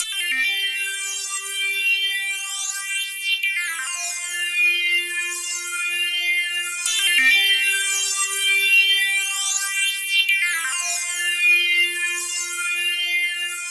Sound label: Flittery, Synths, Modular Electronica; Sonic Foundry (Sony Creative Software)